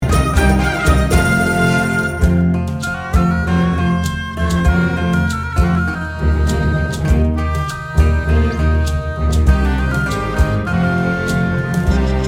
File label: Adult Male Backing